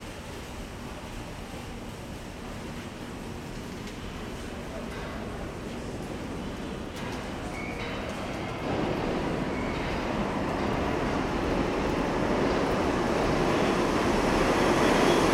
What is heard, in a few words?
ambience train station subway nyc trains people; sound effects one